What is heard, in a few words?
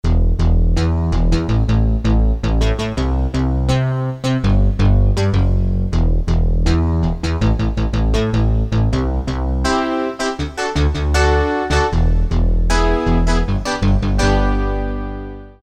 MIDI + LP, MIDI Combos, Korg DSS-1